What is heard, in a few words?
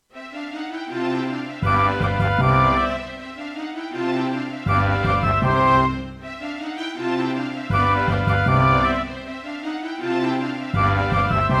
Roland SonicCell; GM2TEMPLATE; classical; Mozart; Concerto K467 partial